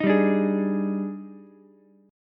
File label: Eb Major 11th, Ukulele, C6; EbM11; strummed; JGuitar